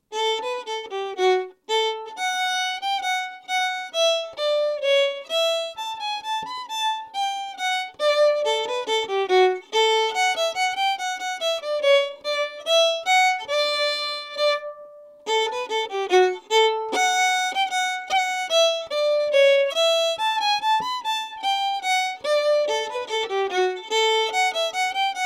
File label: Luciens Polka slow